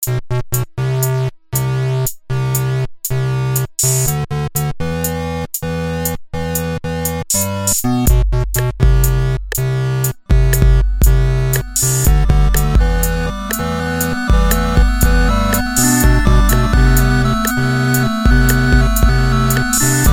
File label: arduino Synth Demo with Effects And Drums